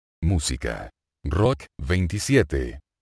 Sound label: rock